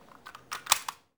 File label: Gun Handling Weapon, Weapons Foley; SFX Kit